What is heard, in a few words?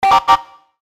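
Musical Element, Drum Snare March; Blastwave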